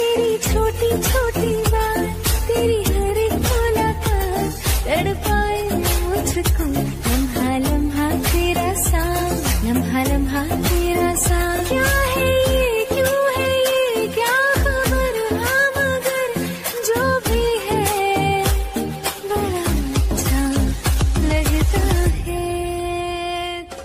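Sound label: instrumental sad music ringtone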